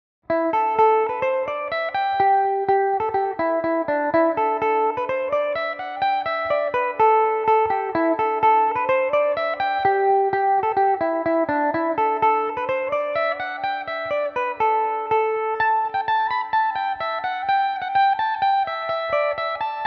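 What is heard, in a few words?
polka A dorian, Irish mandolin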